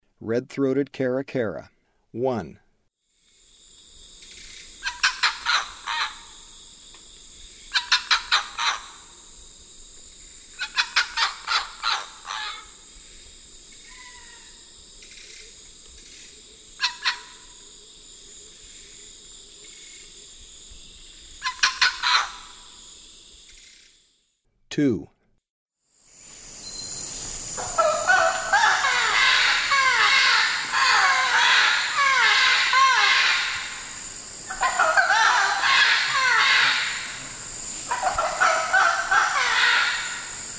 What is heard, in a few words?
Red throated Caracara, Birds of Ecuador; S. America